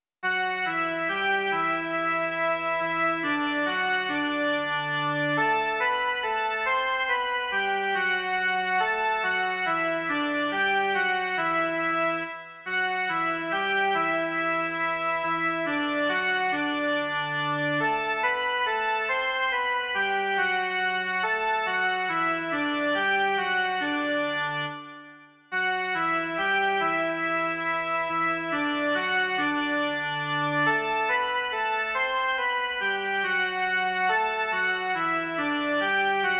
jen melodie; Dej mi, Pane, bdělé srdce

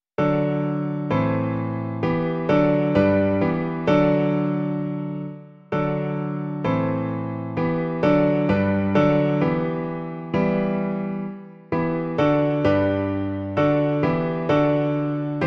klavír; Klavírní verze

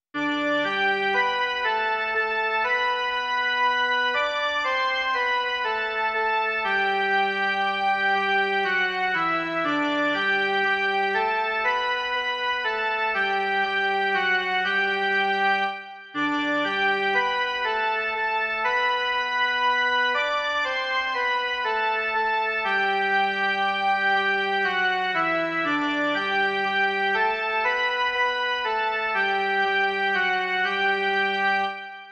jen melodie

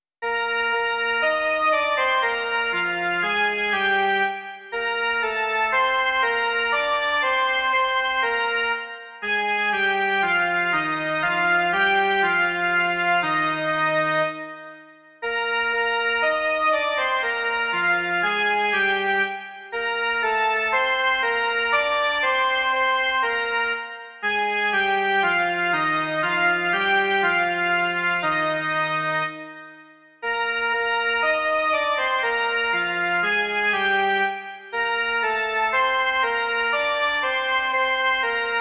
jen melodie; Onen hněvu den se blíží

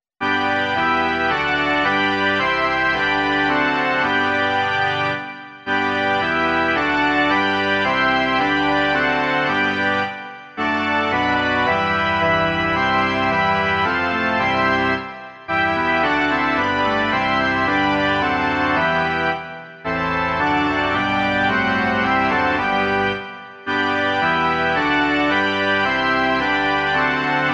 varhany

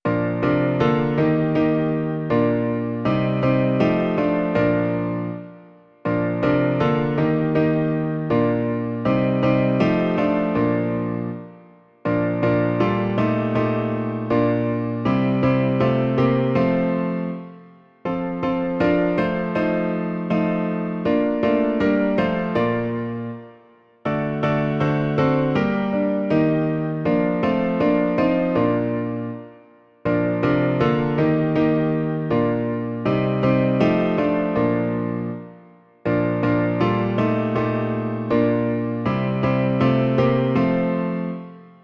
Slož, co srdce tísní - klavír; Klavírní verze

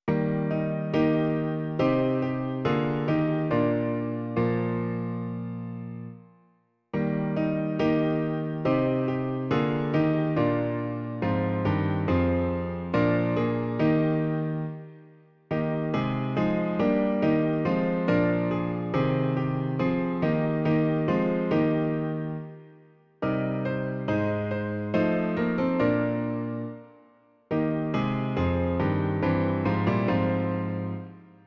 klavír; Klavírní verze

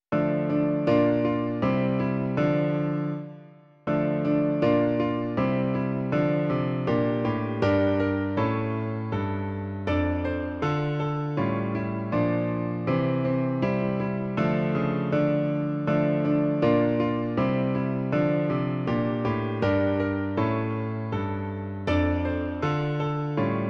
Plakal strachem ve studni - klavír; Klavírní verze

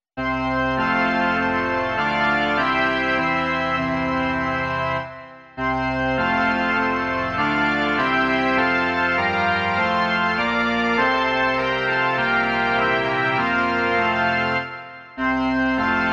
varhany

